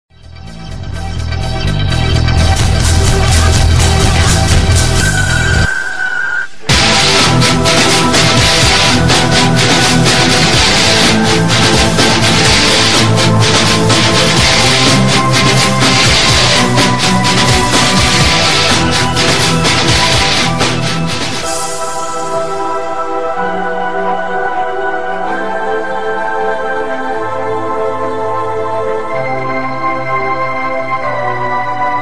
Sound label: instrumental